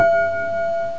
DING

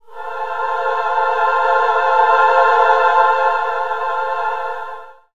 choral, music, chorus; KABOOM! - Wild and Wacky Sound Software

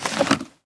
add to storage; Immortal

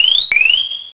crab Steal

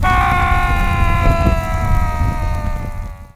Burn Human; 1944 Battle Of The Bulge

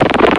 Soft Rapid Fire; Swoop

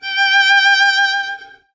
Vln G6, Strings; DirectX SDK; DMusProd

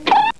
Poit!; Mac Expert - Winter 95; DeBNDL 1.0

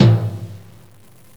Taiko Drum; Percussive; GMPlay

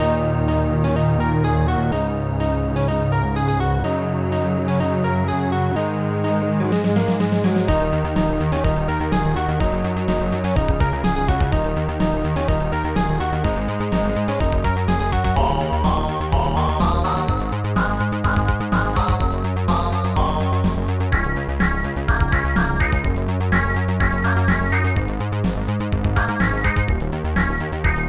tune

